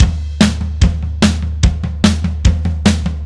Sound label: Silos Beat Crash, Indie Rock; Sonic Foundry (Sony Creative Software); Indie Rock Anthems; Session 11 - Silos